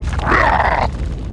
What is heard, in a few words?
WAR ATTACK